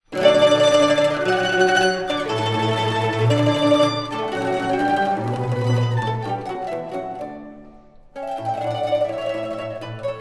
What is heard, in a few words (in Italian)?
Quartetto in Re M; estudiantina; Carlo MUNIER; Opus 128